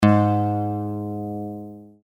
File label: A flat, guitar, E flat tuning; tune your guitar